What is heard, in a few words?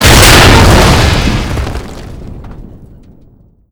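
explosion metal barrel close; Explosions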